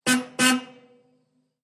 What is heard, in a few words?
33 Pontiac Horn Blow